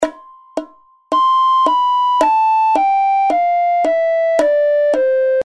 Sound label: recorder c major scale descending